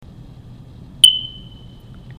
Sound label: Low battery chirp